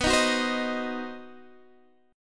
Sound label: C Diminished B, Cdim/B chord; strummed; JGuitar